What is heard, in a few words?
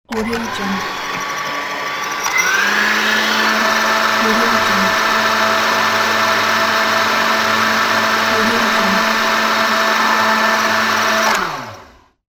mixer